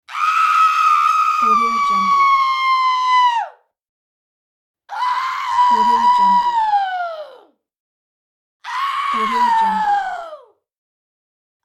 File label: woman scream